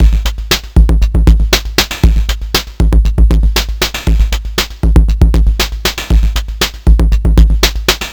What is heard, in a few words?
Drums Strange